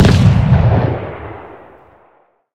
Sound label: art cannon shot far